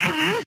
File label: Lunge; Joblin Freelancer Lunge New